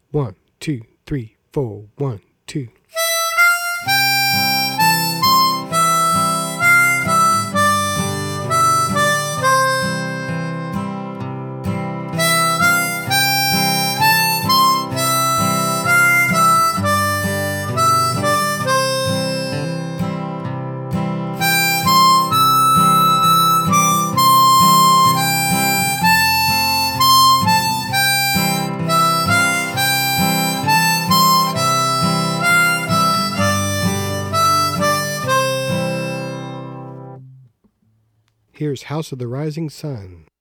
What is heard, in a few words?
Fast; Wildwood Flower